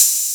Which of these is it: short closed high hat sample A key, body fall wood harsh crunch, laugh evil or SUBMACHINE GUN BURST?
short closed high hat sample A key